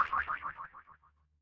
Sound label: cartoon boing